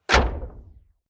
Impact Metal Door Hit Rattle Pitch Down Wobble Robot; Sound Design; Stinger Sound Effects